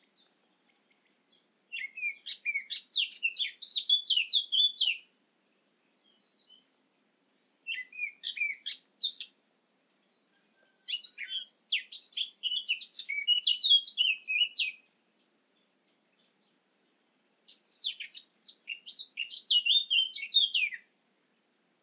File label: Eurasian Blackcap Sylvia atricapilla